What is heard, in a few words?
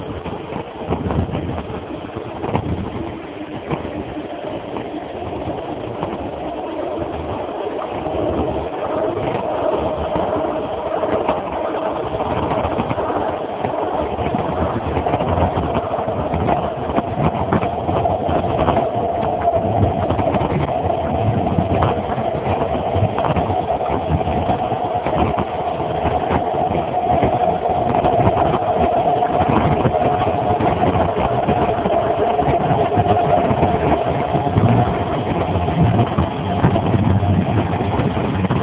SF Bay BART